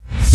REV KICK; Crypton - DrumBase5000

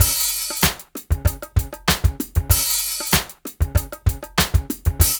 DRUMS; Funky Ass Loops; East West

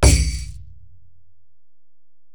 Ac Perc, Drum Machines Alesis DM-PRO

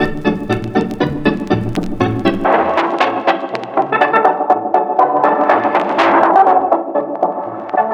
Synth Melody; Eclectic Electronica

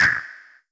Claps, Elektron MachineDrum; SPS1 MKII

